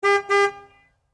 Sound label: Truck GMC Savana Horn Beep Beep; 2010 GMC Savana; Trucks; Vehicles; BigRoomSound